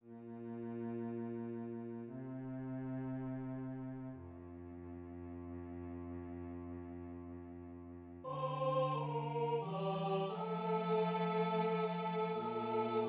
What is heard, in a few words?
choir cello; 1부-기도송